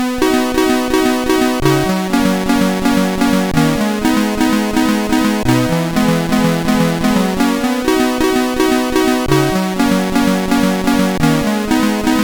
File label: Piano, MOS6581R4 sid; Doxx; hvsc